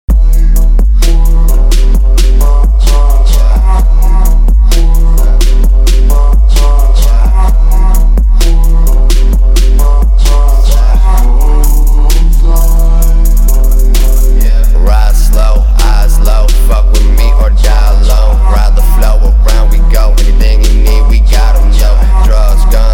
Low Bass

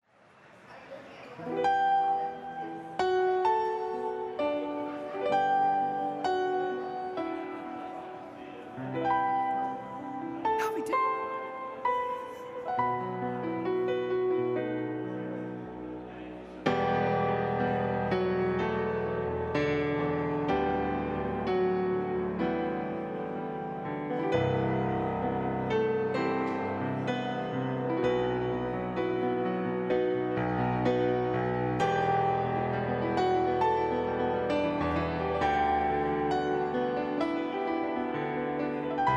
Piano

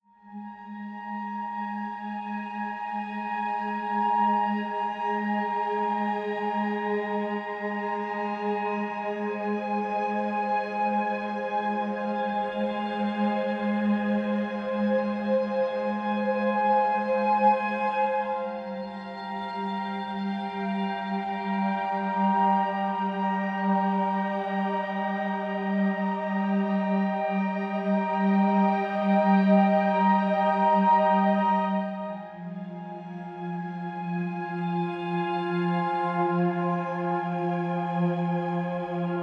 Jazz Music Therapy For Cats

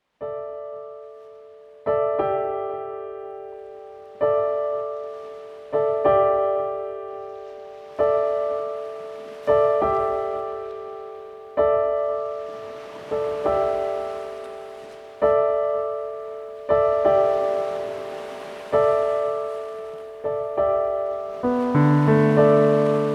Bird Sounds

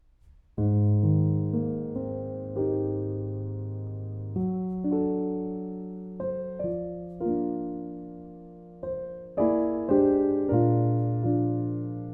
Piano Interlude

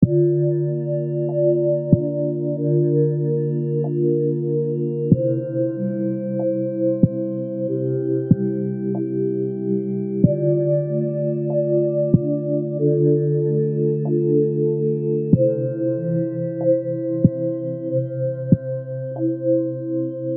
Binaural hertz

